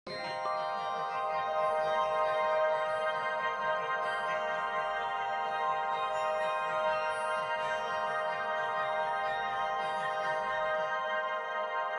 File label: ambient